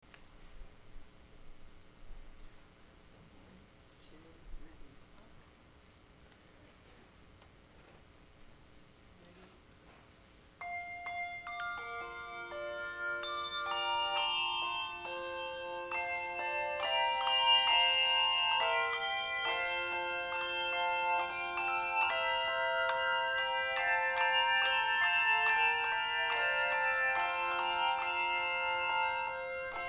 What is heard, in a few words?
HANDBELLS; INFANT HOLY